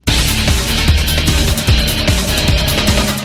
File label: guitar